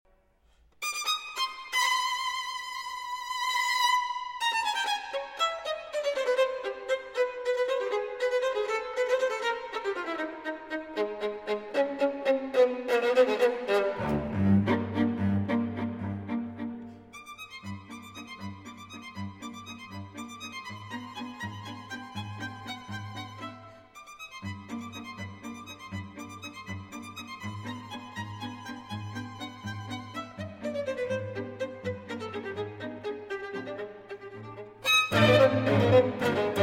String Quartet No. 8 in C Minor, 3. Allegretto

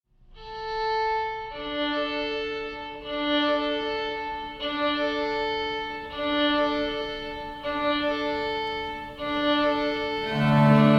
Flutes

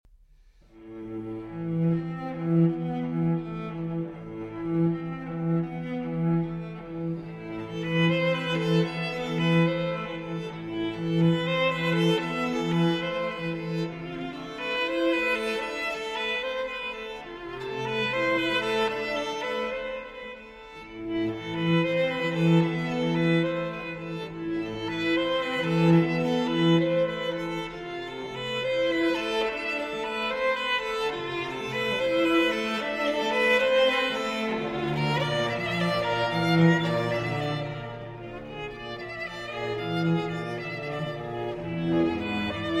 Duo For Violin and Cello

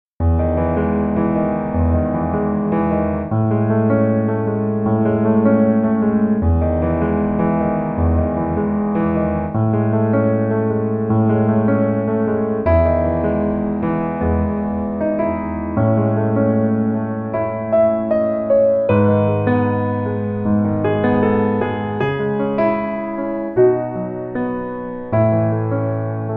Instrumental

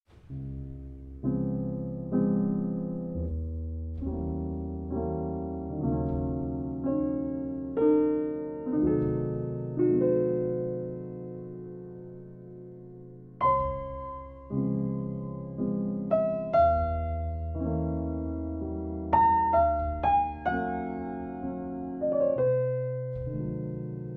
Coffee Shop Jazz Piano; Piano bar

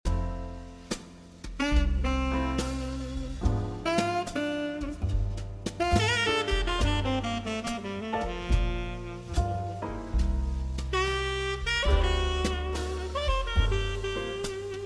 Dirty Jazz

